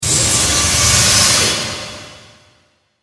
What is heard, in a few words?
Machine Pressure, Machine, Pressure, Release, Motor, Whine, Automatic, Door, Close; 5.1; BlastWave